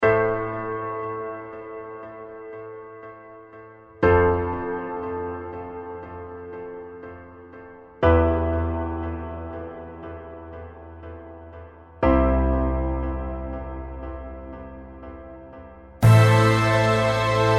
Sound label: Orchestral; FreeCiv